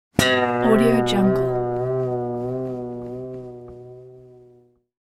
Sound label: guitar boing